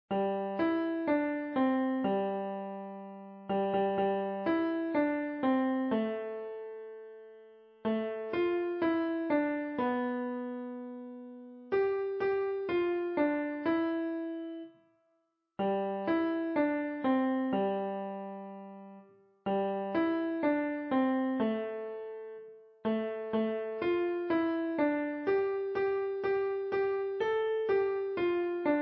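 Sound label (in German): Klavier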